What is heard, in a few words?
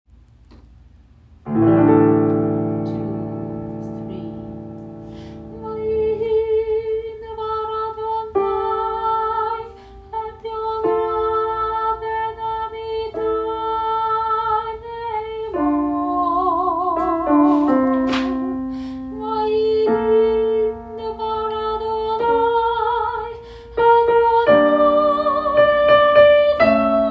Soprano; Chapter 1 mm 7-16; Shirei Chesed Fall 2025 Recordings